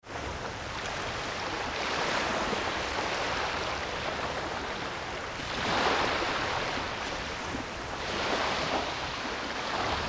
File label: wave